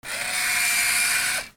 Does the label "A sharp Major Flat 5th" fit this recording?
No